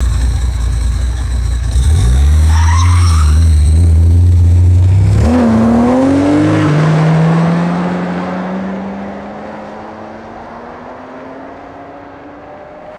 motor vehicle squeals tires and accelerates away

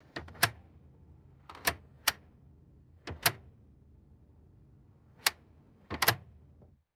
rhythmic ticking of vehicle hazard lights; vehicles

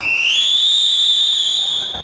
loud high pitched sports whistle blow

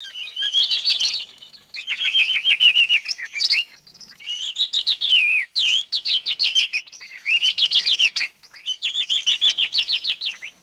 large active flock of birds chirping

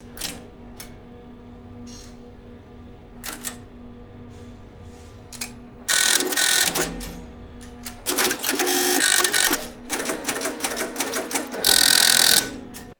vintage mechanical telephone exchange operating

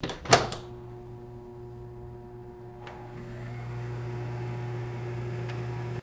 refrigerator door opening with a squeak; doors

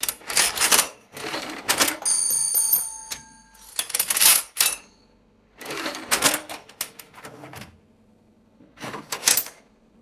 cash register bell coins and drawer closing; drawers

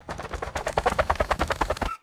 rapid raven wings flapping past

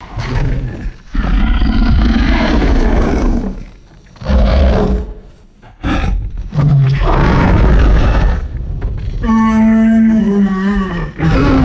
deep rumbling plant creature groans

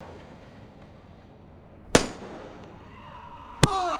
loud pop and distant human yell

